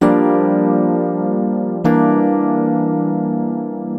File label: Synt; FUNKIN&GROOVIN'